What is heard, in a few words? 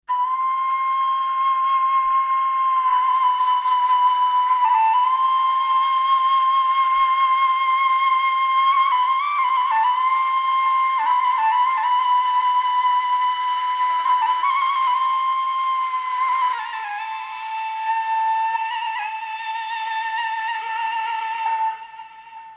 kaval